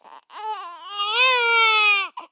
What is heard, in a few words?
Baby Cry